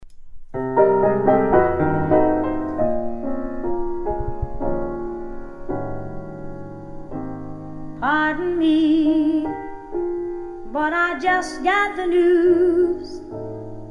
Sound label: analog